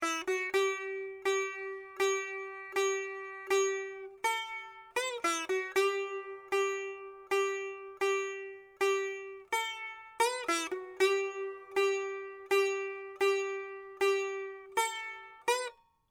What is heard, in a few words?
sitar